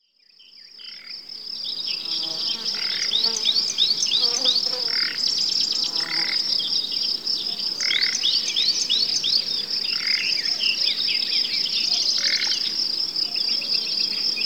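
Broad-Leaved Woodland Late Spring, Rural Soundscapes; Nature - BBC Sound Effects Library; BBC 15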